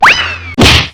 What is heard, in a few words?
cartoon slip and punch sound